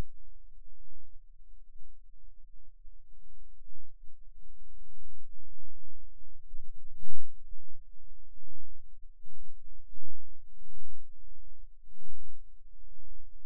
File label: Rhythm Wave, eeg seizures